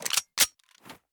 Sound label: Weapon Equip; Unity 2D Game